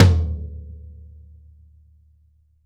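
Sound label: floor tom; drum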